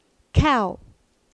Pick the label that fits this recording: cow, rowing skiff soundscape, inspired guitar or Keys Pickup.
cow